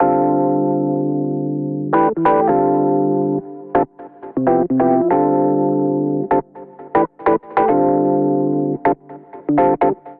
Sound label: Little Amp Electric Piano D Minor 94bpm; MIDI Clips; Accompaniment; Ableton; Chop and Swing